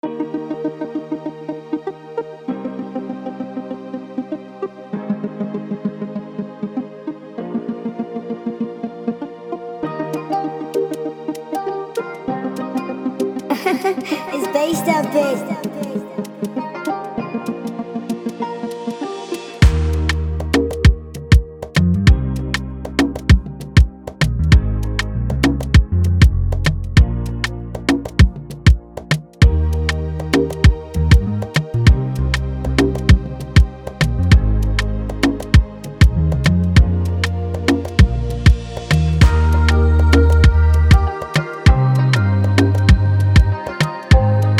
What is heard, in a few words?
Instrumental; Prod. By Bazestop Beat